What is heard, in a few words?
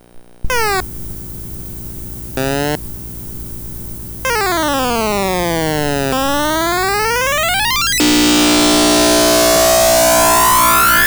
pitchbend; gbsoundcomparison